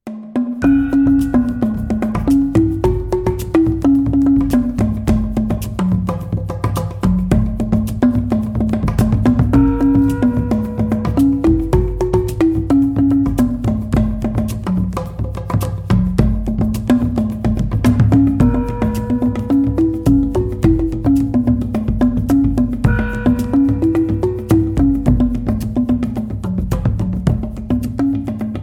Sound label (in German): Melodie Bamboo